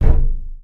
wood crate impact soft, physics

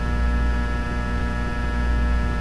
power transformer loop, ambient machines; Source Engine; cstrike

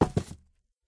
phys cube, physics

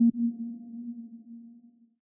node absent; ui

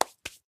hands; taunt; player; yeti standee; sniper; source engine; tf

